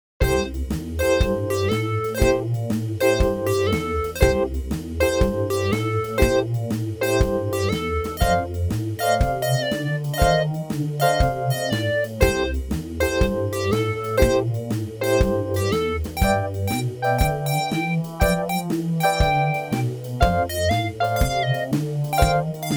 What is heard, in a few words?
MIDI blues